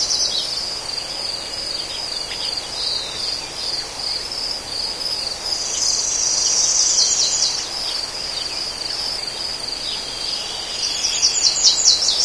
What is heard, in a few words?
orchard bird cricket; video game; Left 4 Dead 2; Source Engine; ambient